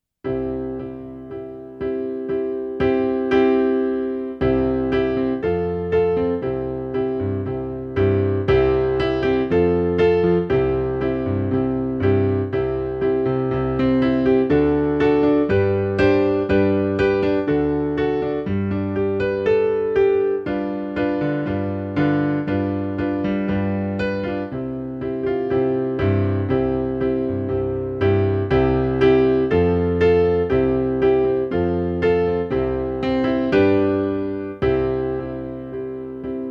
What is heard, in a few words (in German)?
Oh Du Fröhliche Piano G Dur